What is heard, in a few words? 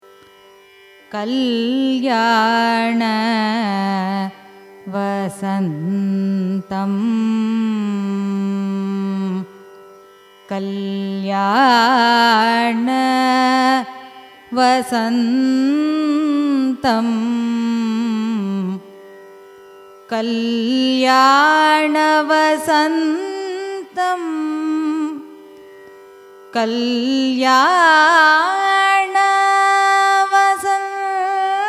raga kalyana vasantham signature; carnatic music